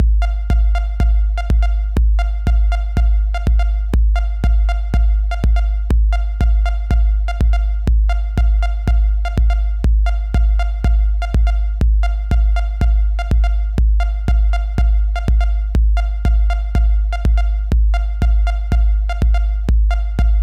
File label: Beat Bass